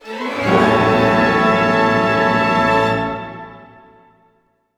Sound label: GLIS A MAJ, gliss major, orchestra; Sound On Sound - Peter Siedleczek's Orchestra